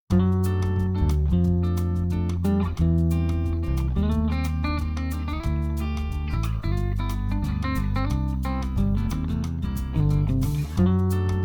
Jazz; Bright Jazz

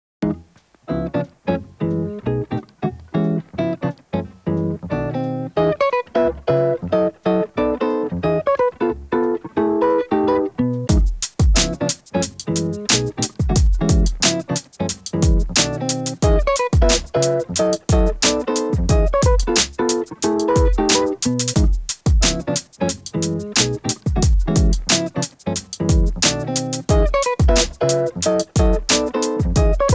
Bright Jazz

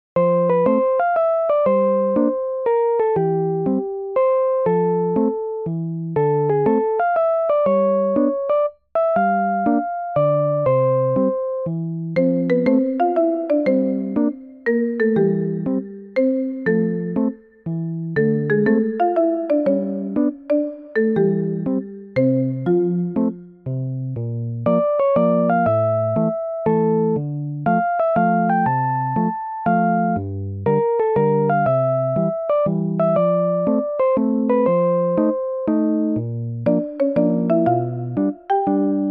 Newage; 밝고 맑은

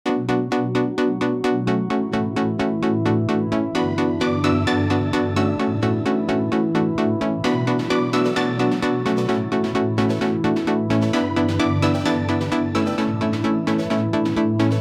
Call It Echo, EDM Pop

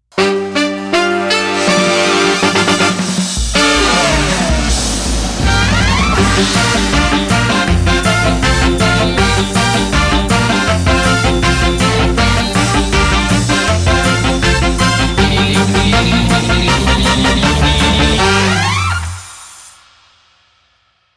Brassy

